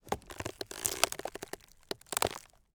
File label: CREAK Wood Tree Creak Long Subtle; mono; Universal Sound FX